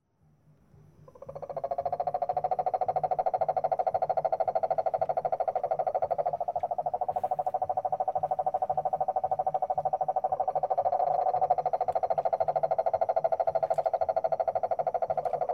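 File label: Cane Toads San Jose Costa Rica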